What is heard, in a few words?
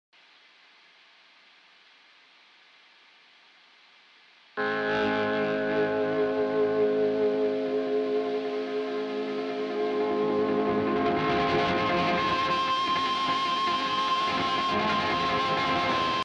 Feedback, Guitar; Amplitube